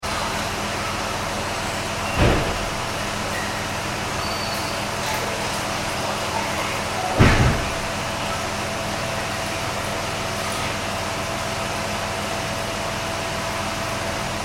ambience toilets public; Public Toilet Ambience - Loud Extractor Fan Noise Close - By And Lots Of Dripping Of Water; Bathroom; Household

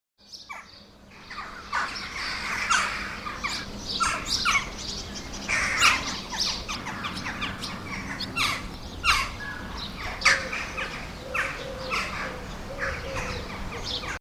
Jackdaws, Flock of Jackdaws in English village.; Birds; Animals

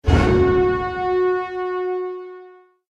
Impact Cinematic Trailer Strike

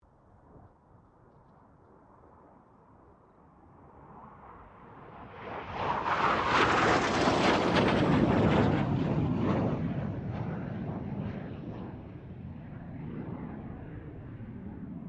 FA-18 Hornet pass; RAAF FA-18 Hornet; Airplanes

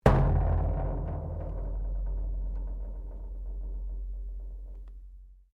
METAL IMPACT RATTLES; Materials

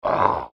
Hobgoblin Warlord, monster; fantasy sound effect; Andrew Fleming; Twistedtracks

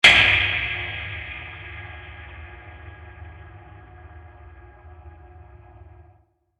Hollow Metal Impact; Blasts; Impacts

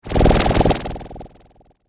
Hit Flange Warble; Sound Design; BlastWave

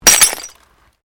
GLASS SHARD SMASH

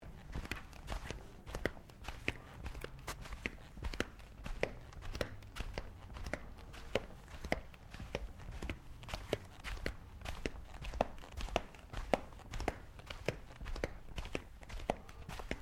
FOOTSTEPS THONGS (FLIPFLOPS) CARPET SEQUENCE; Footstep Foley; Sound Effects:Human

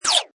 Cartoon Zap, Zipping, zapping swirl.; Comedy/Cartoon, Zap Up, Down; Funny Sounds

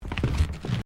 Footsteps Stairs Wooden Carpeted Step; Crocs; Footstep Foley; Human

